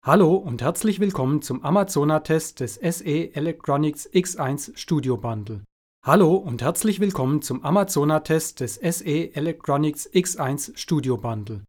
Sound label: Fills